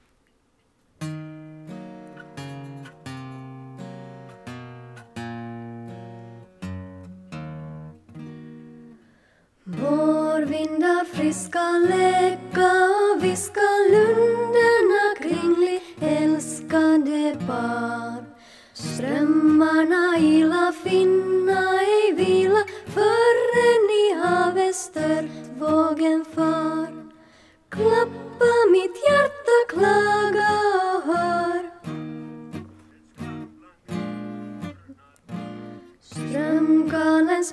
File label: varvindar sopran